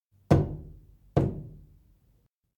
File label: knock bedroom; anemoi パッケージ版